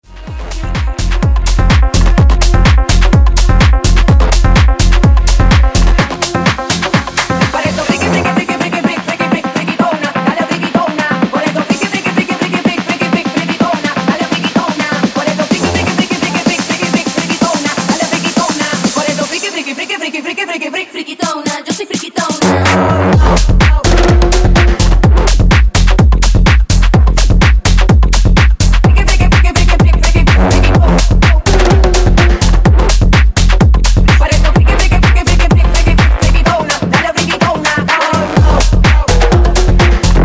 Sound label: tech house breaker 126 bpm